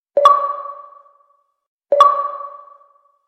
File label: Note Sonar; Xbox